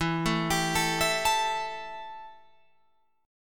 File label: E Minor Double Flat 5th E Guitar Open F; Embb5 arpeggiated